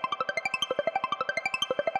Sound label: Coin Count; netent